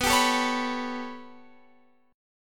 B 7th Suspended 2nd Sharp 5th down Guitar Open F, chord; B7sus2#5; strummed